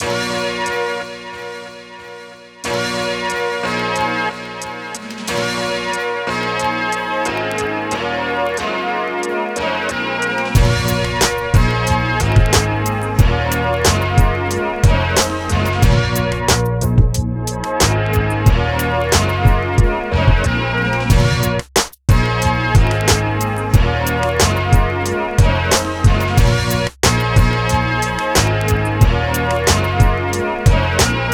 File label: Wild Style Beat 91BPM